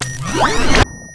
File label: rail up